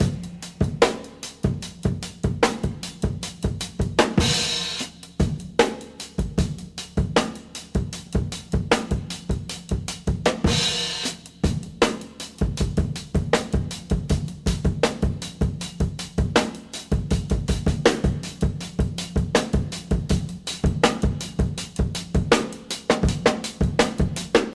107 bpm drum loop C# key, Free drum groove - kick tuned to the C# note